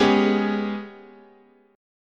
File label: Ab Minor Major 7th Double Flat 5th, guitar chord